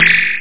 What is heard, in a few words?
bumper